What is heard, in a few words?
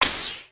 deflate